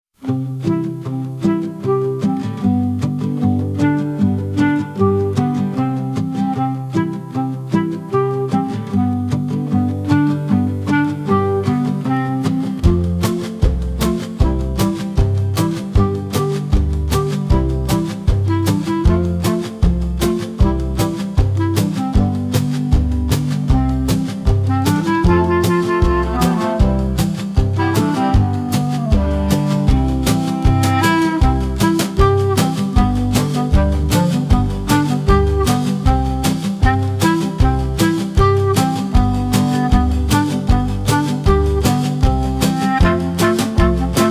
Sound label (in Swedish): Syskonlat instrumental